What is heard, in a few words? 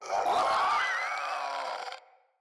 Infested Crawler Death, enemies; Crawlers